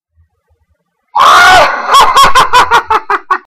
risa

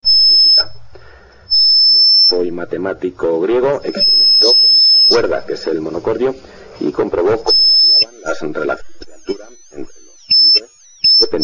monocordio

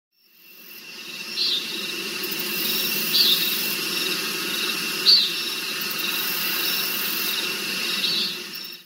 sonido noche

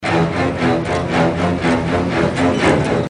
musica tiburon

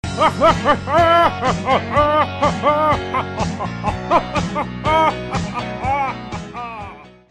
risa

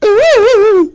husky espasmos

